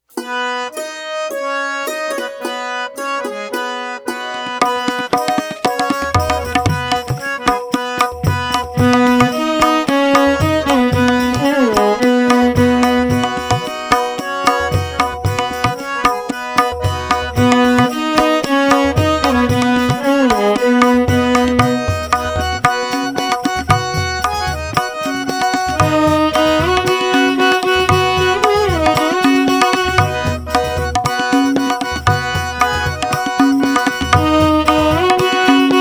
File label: Ladies Karaoke B Pancham